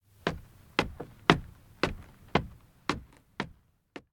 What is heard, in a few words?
Footsteps Wood Hollow Walk; The 39 Steps